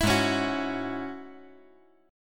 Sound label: Ab 7th Suspended 4th Sharp 5th down, chord